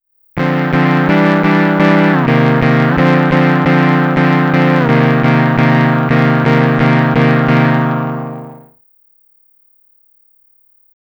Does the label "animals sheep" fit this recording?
No